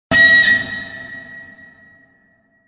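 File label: Electric train horn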